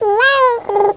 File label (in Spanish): miau